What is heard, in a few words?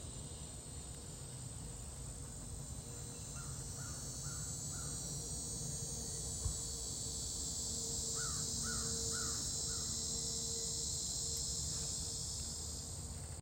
cicada crows, field recordings; scout island